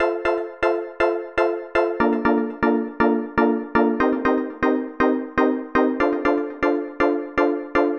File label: House chords